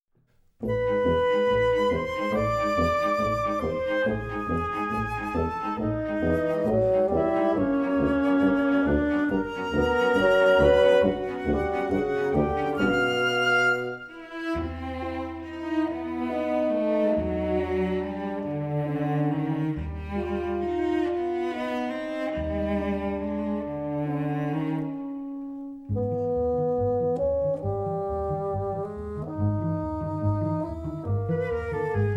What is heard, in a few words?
Playful, Cartoonish, orchestral